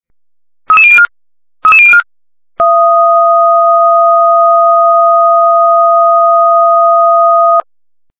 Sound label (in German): 5 ton sirene